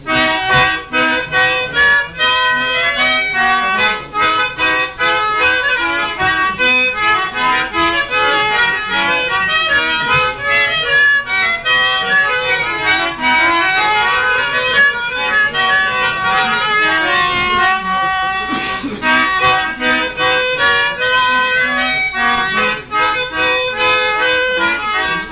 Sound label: march; Main Squeeze; Speakeasy; Handel march